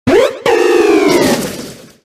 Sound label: cry; Decidueye